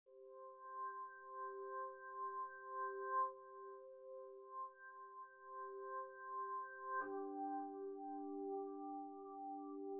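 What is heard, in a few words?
no drums synthetic convolutive 1m; SASSEC07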